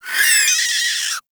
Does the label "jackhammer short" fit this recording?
No